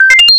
computer; sole defender

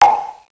POOK

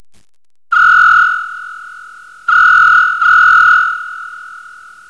GRILLE

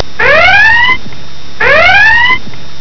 ALERT

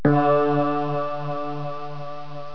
DING

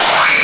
ZAP; HIGHTECH

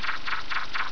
LED GUN; LEDWARS

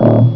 HONK IT

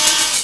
OPEN HAT

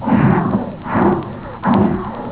MONSTER

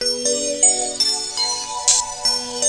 ERROR

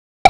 EGG POP

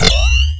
PLAT CLIK

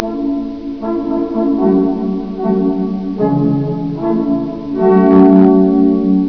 TRUMPETS

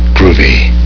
die

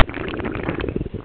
blood

